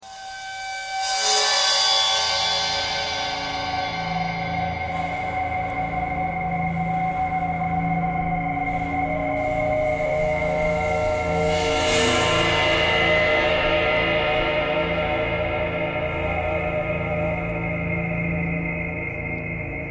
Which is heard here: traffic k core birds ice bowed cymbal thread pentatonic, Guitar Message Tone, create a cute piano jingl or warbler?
traffic k core birds ice bowed cymbal thread pentatonic